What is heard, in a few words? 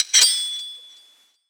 ping